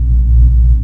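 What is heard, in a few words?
hum low loop, ships engine; Quantum Redshift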